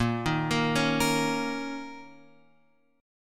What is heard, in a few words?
A sharp Minor Double Flat 5th; A#mbb5 Chord {6 6 8 6 X 6} arpeggiated